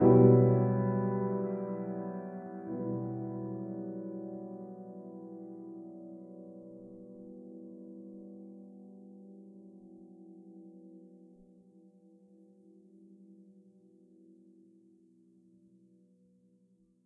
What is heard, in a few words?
Piano